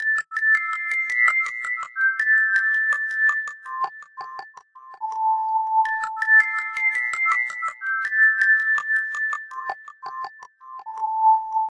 flowing river plucked bell synth, synth and electronic loops